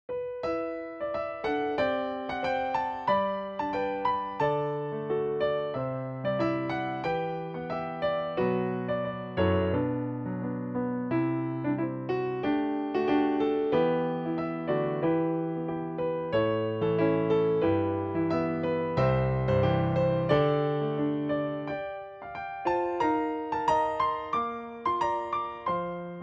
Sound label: Solo Piano